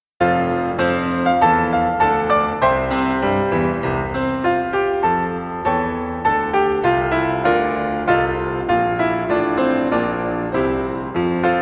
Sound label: majulah singapura piano; national-symbols; anthem